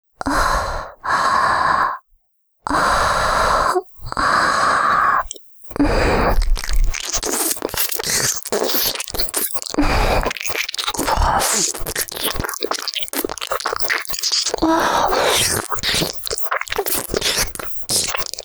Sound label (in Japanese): おまけ音声 (耳舐め),ASMR; 嗤うヤクザと怯える親娘; ＳＥなし